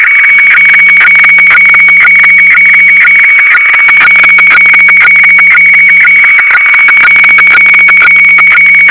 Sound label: fax